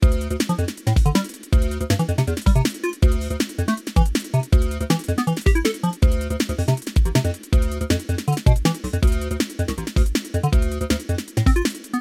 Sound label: osc sync audio example